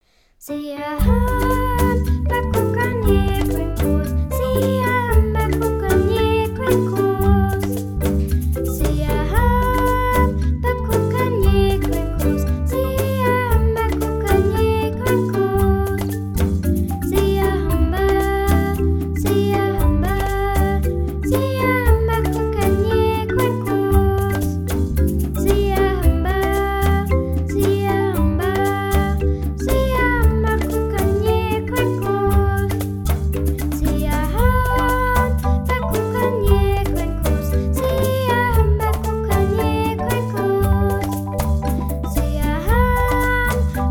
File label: voc; siyahamba